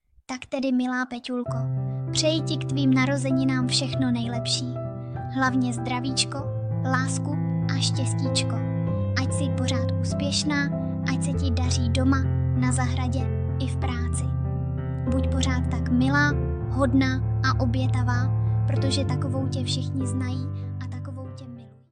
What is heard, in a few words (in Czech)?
klavir